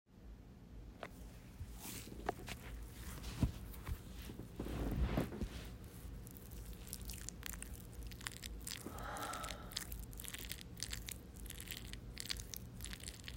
just moans and wet sounds, real orgasms, Moans, whimpers; F4A